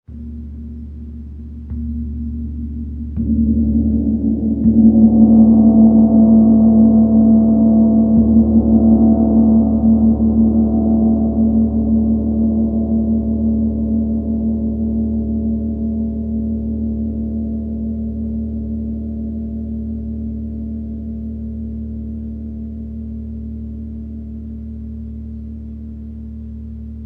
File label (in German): Gong